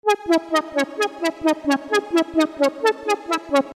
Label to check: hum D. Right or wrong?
Wrong